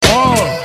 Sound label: Moan